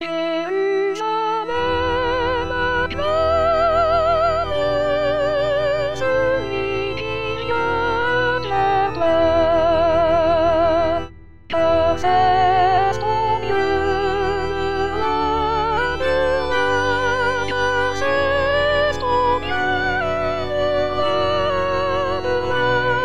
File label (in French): soprano